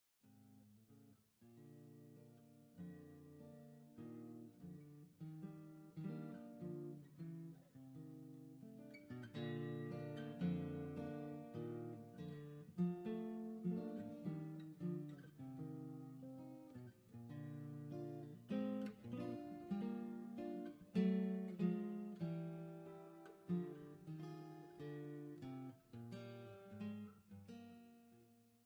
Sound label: Piano Sonata no 7, transcribed for guitar; Sergei Prokofiev